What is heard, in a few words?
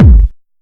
verby kick drum sample c key